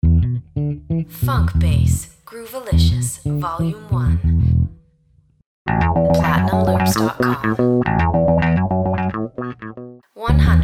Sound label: funk bass groovalicious